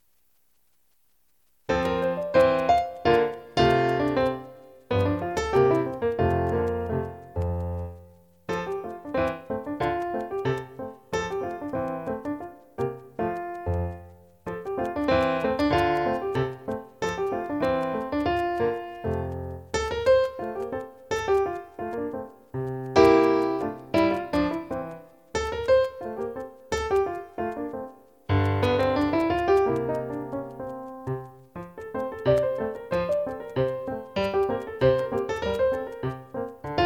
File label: french rag